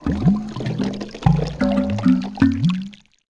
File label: WTR TOWER, buildings